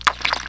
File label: small splash; Hellboy